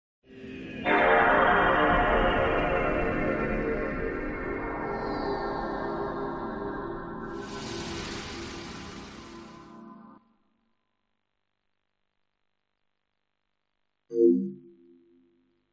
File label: playstation 2 startup